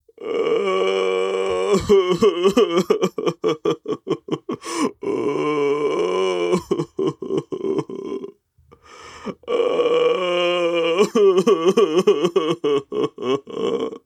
Cry; Hollow Knight (gamerip) (2018)

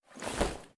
Clothes Drop

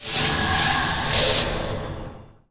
Great Heal; Knight Online

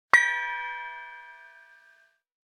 Simple Bell

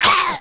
Attack; Kecoon; Knight Online

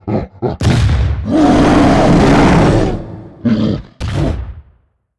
Monster Spawn Initial; Monster George

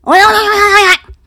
Wild Slash

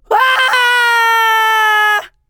Enemy Generic Human Death Falling

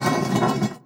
Turrets Metal

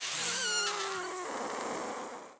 Fungus Balloon Death; Fungus Balloon Death 2

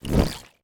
Purple Battery Plate Light Off

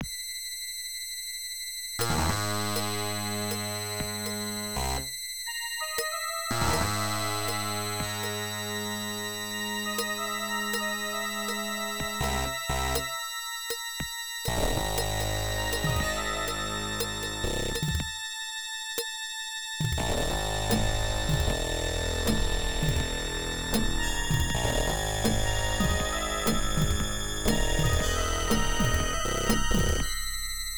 PSYCHO

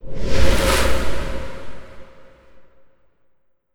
Night Wind; Gargoyle

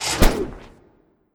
Catapult Bones Launch

